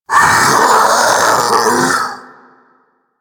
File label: Infested Splitter Split Vocal Death; Warframe; Grey Strain